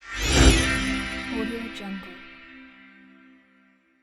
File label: magic spell cast